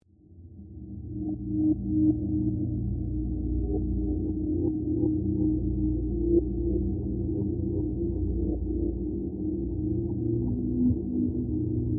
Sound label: Drone Paranormal; Sound Design